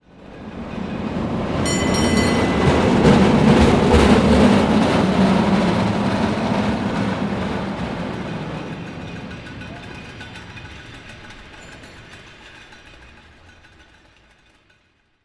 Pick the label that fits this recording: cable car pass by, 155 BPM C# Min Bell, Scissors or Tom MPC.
cable car pass by